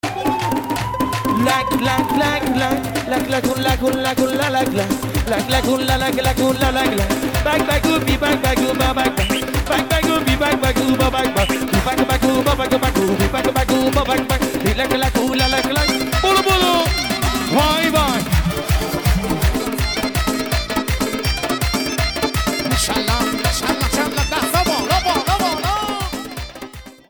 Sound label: Phone Ringtone